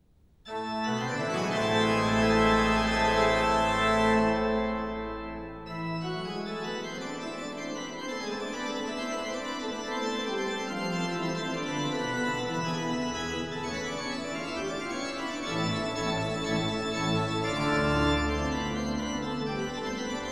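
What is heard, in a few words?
Prelude, organ; J. S. Bach; Piet Kee Plays Bach, Volume 3; Eight (short) Preludes and Fuges; No. 7 in A minor, BWV 559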